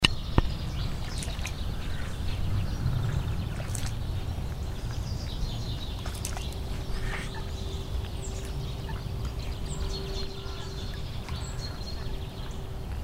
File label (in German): seegeraeusche